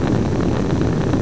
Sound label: lantern; svencoop; hunger; thambs